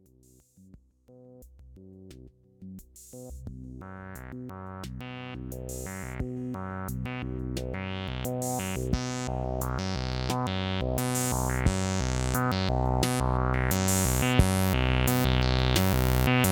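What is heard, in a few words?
TR808 Simple Sequence; RetroWave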